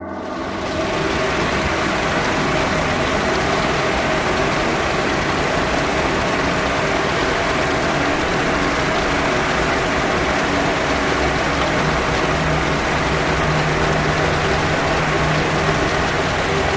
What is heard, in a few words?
Liquid heavy rushing fluid tones with subtle lower moans